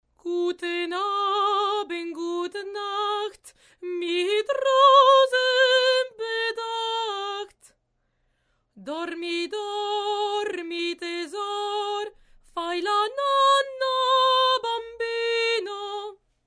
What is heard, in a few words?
VOX Sample